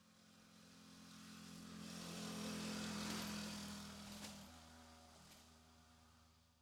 moped; wild bazaar

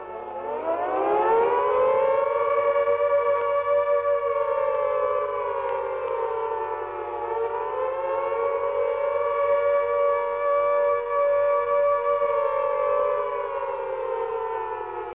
bomber Siren

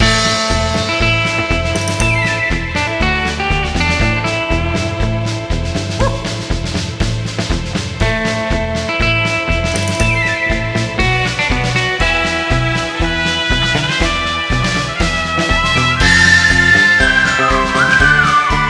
ambience General; wildwildwest

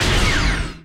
tension End; wildotron 3000

